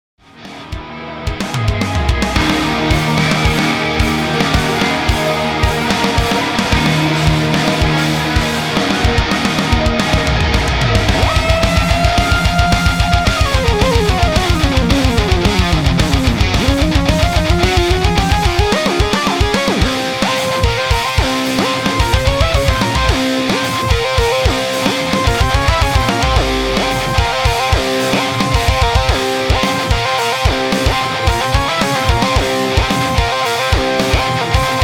synth3 full

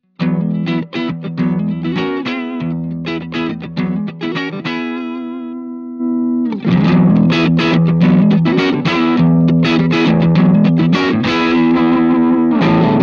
ACS1 v2 Blue Boost; Walrus Audio

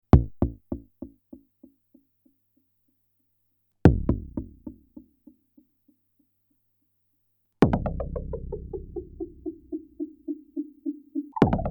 Soma Pulsar 23 Patch DSP Clock Modulation

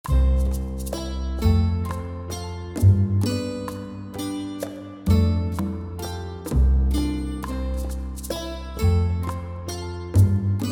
HaloVerb Hall; Metric Halo v4; Cuba NKI

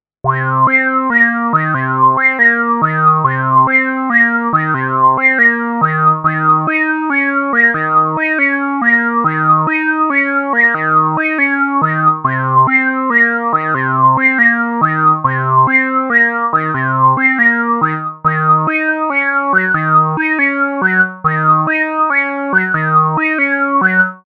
Goblins Talk VCOs

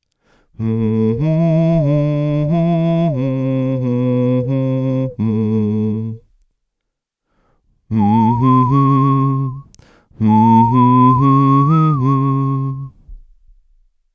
Zoom V6 Vocal Processor Whistle

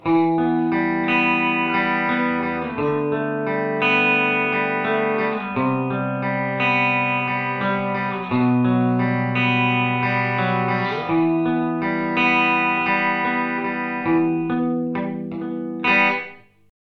Meris Mercury X Room

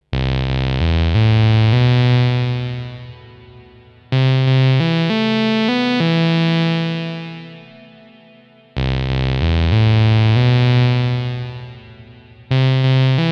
Ultraplate; Synth Mercury X

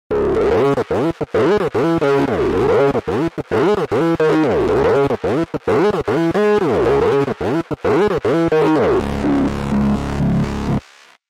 Mini Brute Rezo Pulse

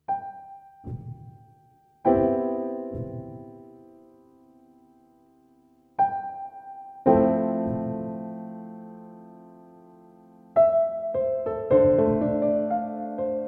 USA Grand Piano; Studiologic Numa X SE

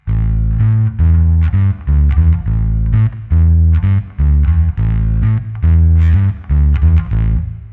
Boss Katana Go Bass Gritty

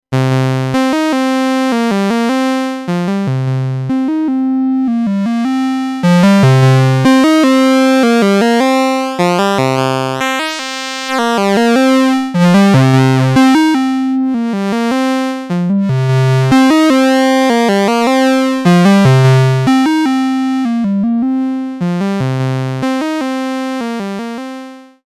VCO DCO Wave; MFB

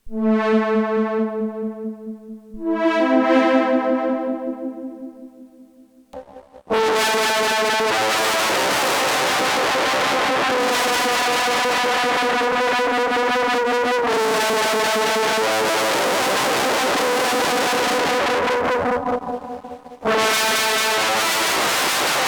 Octave Clang Synth Octave Fuzz; Death by Audio

